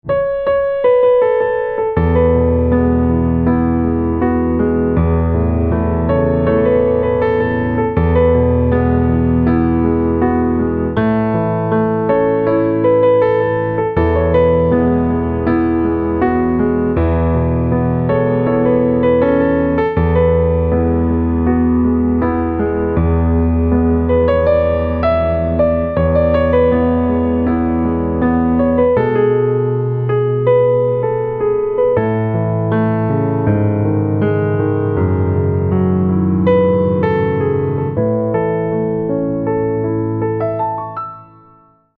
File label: Piano Silent; instrumental ringtones